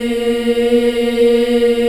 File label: A#2 CHILD EH, Choir; Acoustic & Synth; CHILD EHP; AKAI; USB Soundscan vol.28